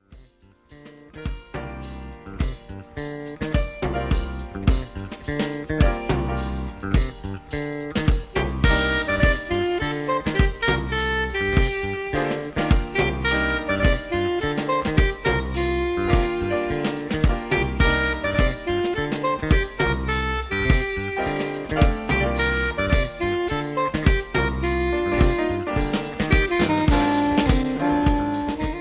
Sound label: Cool Jazz; on hold message music